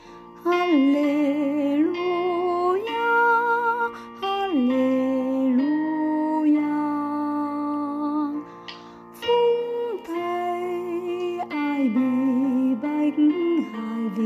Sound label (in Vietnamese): Aleluja Nam